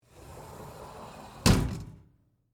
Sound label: Door Slide Close, Foley, Door, Slide, Close, Closet, Door; Blast Wave